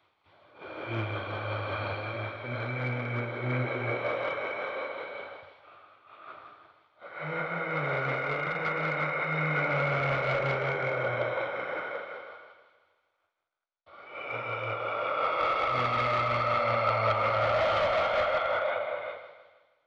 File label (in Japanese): zowawa; 男系 霊 ４; 耳元でささやく霊 左右に移動 気持ち悪め バックサウンド